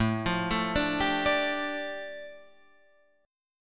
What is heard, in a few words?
A 7th Suspended 4th Sharp 5th, A, down, Guitar, Open F; A7sus4#5 arpeggiated